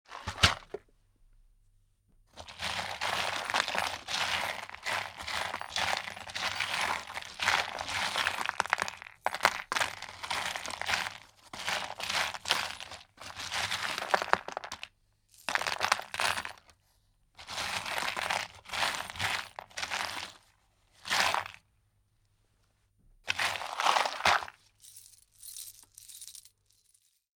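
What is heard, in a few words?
screws, nuts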